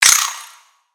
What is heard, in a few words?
Spider Die; Lego; creatures mechanical